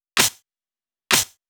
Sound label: Clapsnare, 128 BPM; Vengeance Samples; VTDS2 Song Kits; Rap To The Top